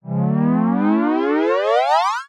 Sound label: cartoon rise asend elastic stretch; zapsplat